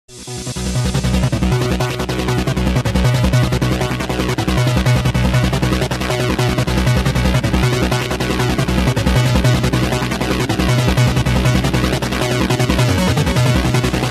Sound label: Electronic Mind Flanger Mix